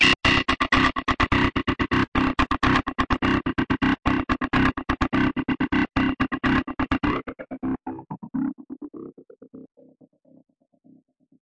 126 space banjo

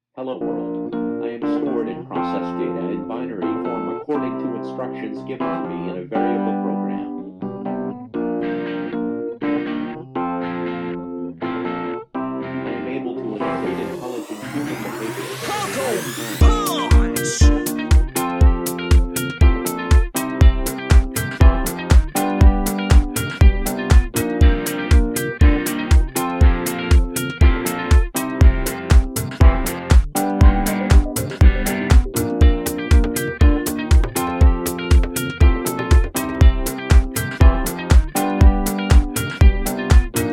70s night

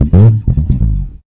Bass FX A, Bass Guitar Licks FX

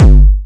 Syn Dr, Overloaded Synthetic Drums; style kits

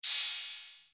Roland T6 Hats; drum machines

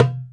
arabic perc, Arabic Percussion Kit

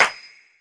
divine clap ring, drums single hits; Claps

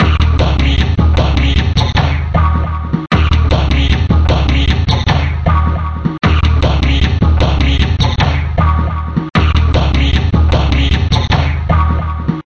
dark lofi funk, drum loops and breaks; 141 to 160 bpm

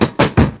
Stanky BK, dirty hip hop; Dirty Hip Hop Kit; style kits

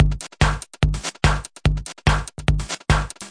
145 tekno flubmaster; 141 to 160 bpm

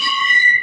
subway sound; Subway Sound Construction Kit

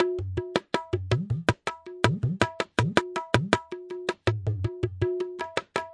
162 hard tabla tapper; 161 to 180 bpm

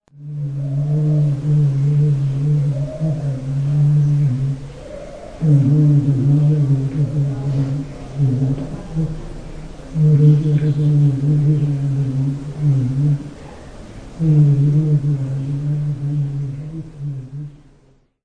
christian monk chanting; MISC and SPOKEN WORD; VOCALS and SPOKEN WORD

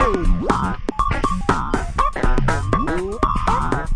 superfunk; melodic loops; synth and electronic loops

